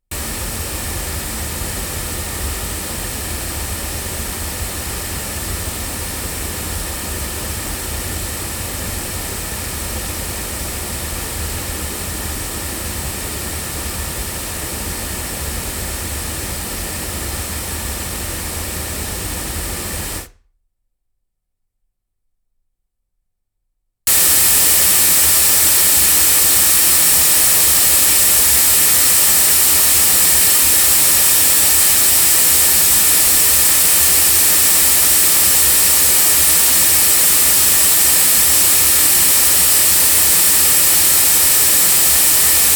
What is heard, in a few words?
cardioid loudspeakers; se electronics